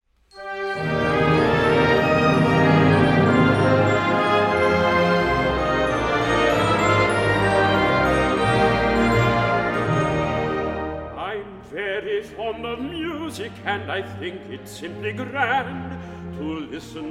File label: Bassoon Song